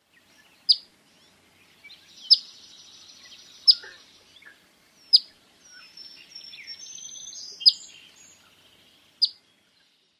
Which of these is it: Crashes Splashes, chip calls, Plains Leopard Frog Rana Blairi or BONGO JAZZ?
chip calls